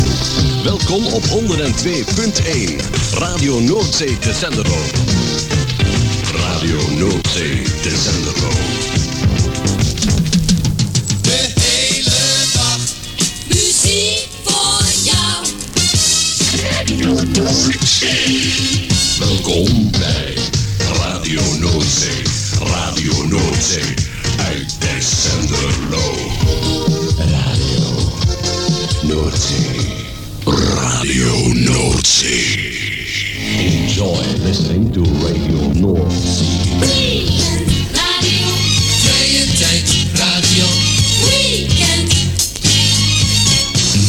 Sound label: jingles; NOORDZEE Tessenderlo